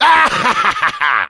Demoman laugh short, fr

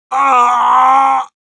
pain severe, ru

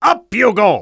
Spy taunt flip exert

